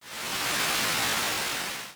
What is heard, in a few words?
laser fire